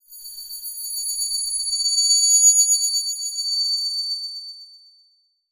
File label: Title Transition Electrical; Production Element Title Transition